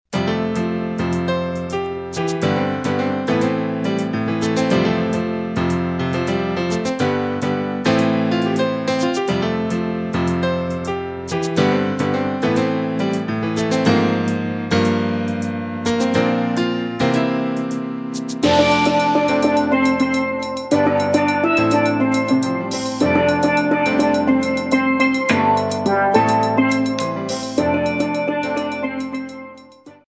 steel and voices

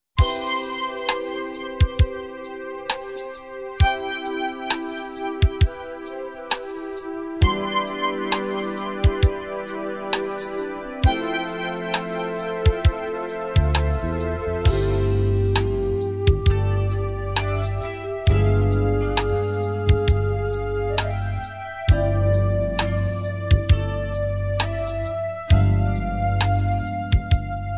steel pan sax

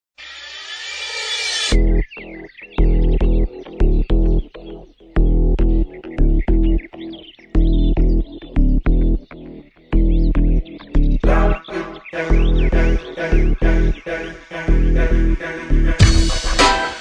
steel dub

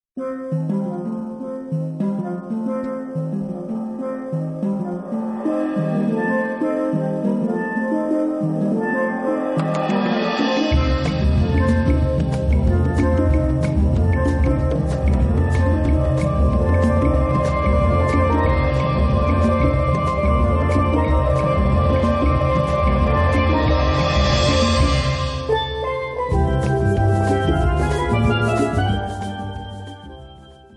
steel pan music

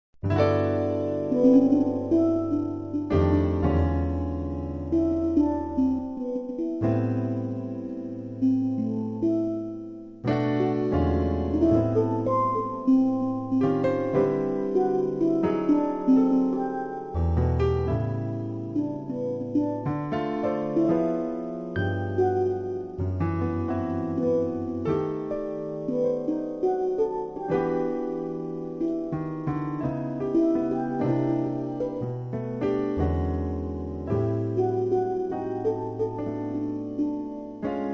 blues

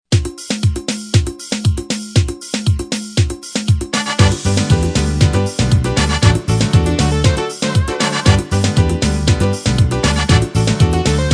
steel and voices

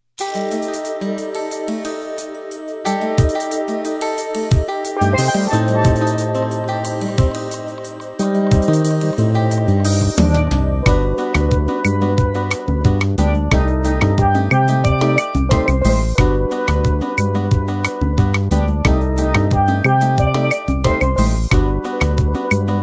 pan meets guitar

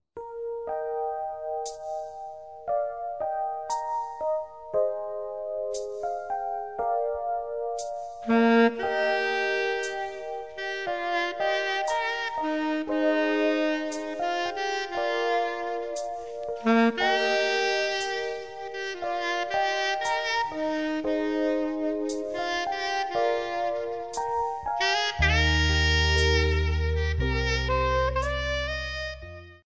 steel pan sax

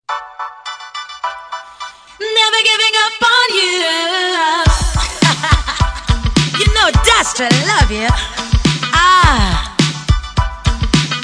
steel dub

